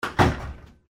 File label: door slam